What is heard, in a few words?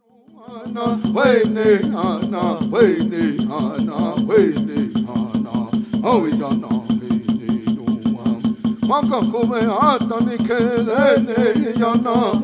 Ceremonial Song